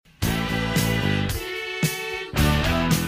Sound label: heavy metal